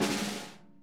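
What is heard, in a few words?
snare buzz; Sonic Reality; Interactive Drum Kits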